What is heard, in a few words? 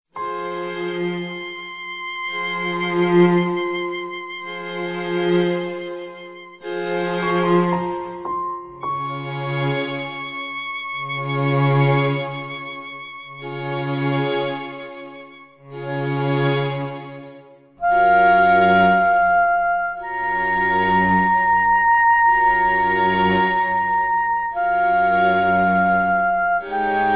oboe; Quart; Aus „Liebe ohne Fahrschein“; Regie: Erwin Keusch; Filmmusik